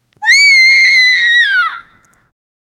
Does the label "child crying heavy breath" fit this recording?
Yes